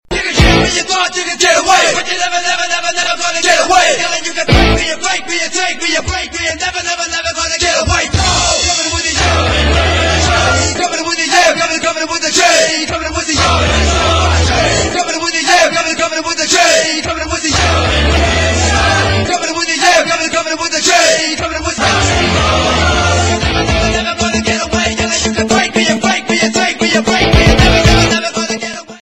ringtone

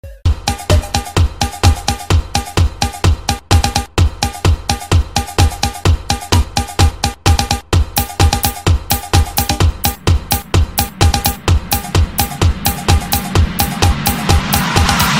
samsung galaxy ringtone; m40

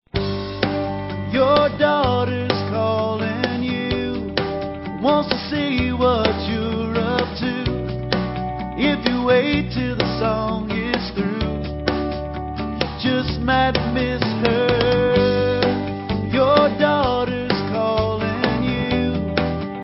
call from daughter ringtone

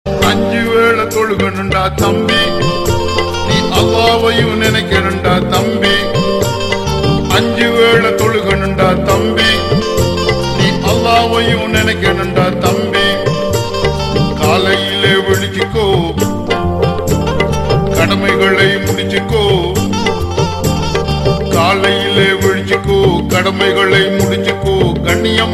tamil islamic muslim ringtone; islamic ringtones

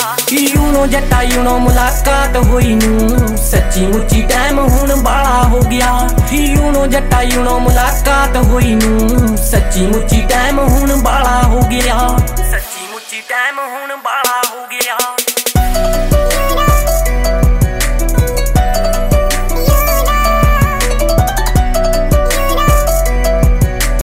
punjabi ringtone

